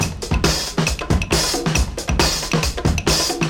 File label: 69 bpm high quality breakbeat d sharp key